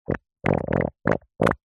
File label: human baby poop wet squelch